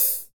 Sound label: HH, Real